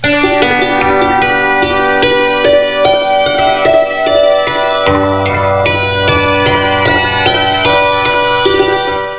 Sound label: dulcimer